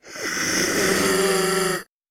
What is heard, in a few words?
Monster Animal Groan short